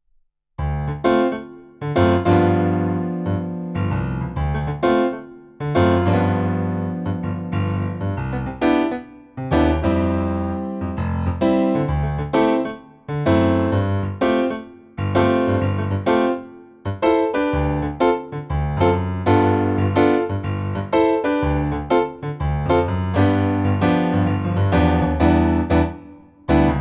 Kawai CA15 Concert Grand

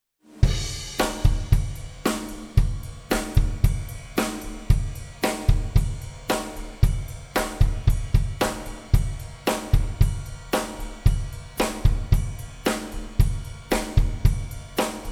Drums Bypass; Drawmer

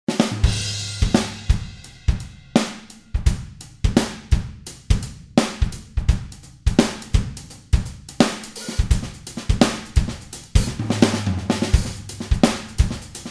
Pop Rock Shuffle

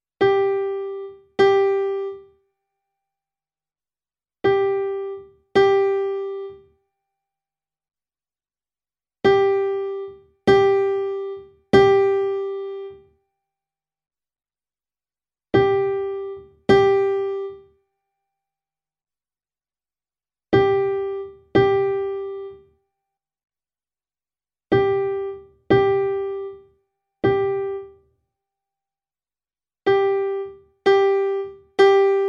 Vivo S7 Piano

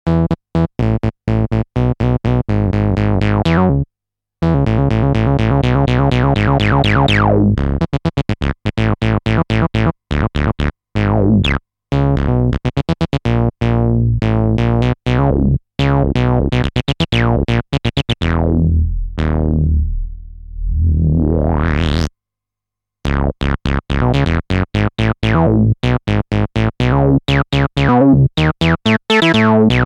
The Crazy Bass

